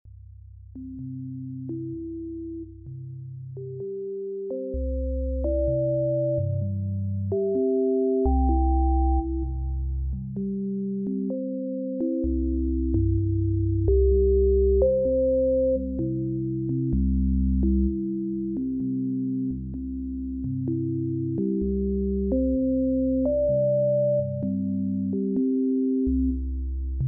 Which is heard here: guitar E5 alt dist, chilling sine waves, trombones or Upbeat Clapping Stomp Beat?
chilling sine waves